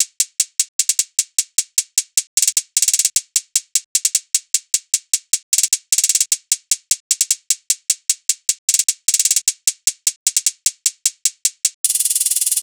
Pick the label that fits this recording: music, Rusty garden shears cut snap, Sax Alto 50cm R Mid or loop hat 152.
loop hat 152